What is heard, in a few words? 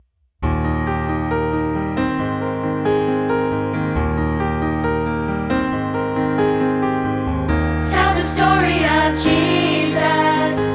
Piano